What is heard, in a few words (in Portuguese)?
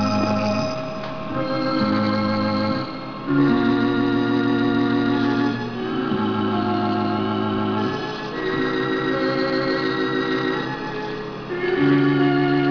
orgao